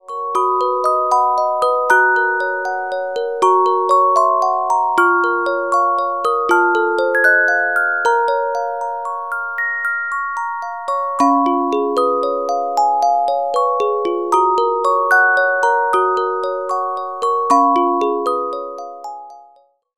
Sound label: Carillon Music Box Melodies